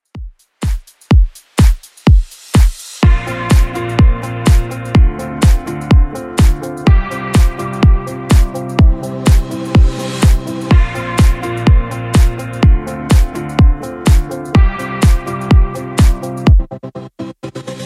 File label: Top40 Redrum 125 bpm